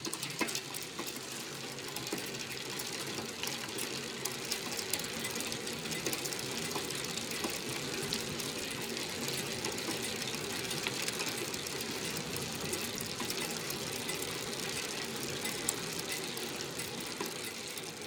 Bicycle ride raise pitch; anemoi

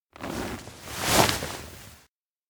grass whistle take pocket; spica; anemoi パッケージ版 1.1

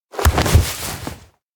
fall thud; anemoi

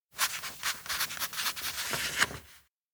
diary write; anemoi

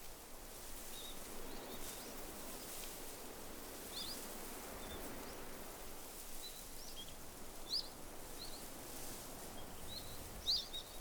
outdoor mountain road; anemoi

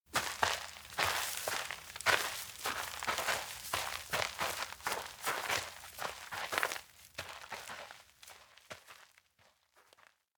steps outdoor mountain road walk; awayuki; anemoi

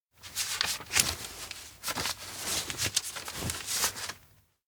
cash tuck coveralls multi; anemoi

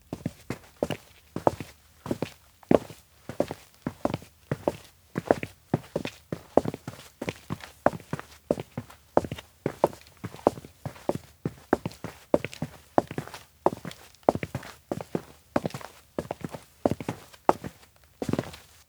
steps outdoor multiverse residential fast walk; LOOP; awayuki; anemoi

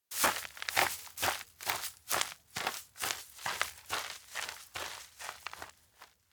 steps outdoor wheatfield walk; spica; anemoi

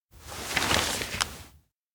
find evidence; anemoi

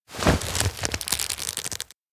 choke neck; anemoi

